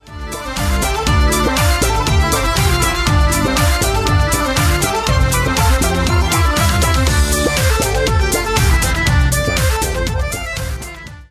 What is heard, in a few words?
Trance Celtica; keltronic